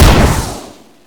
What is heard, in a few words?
fire; hellblazer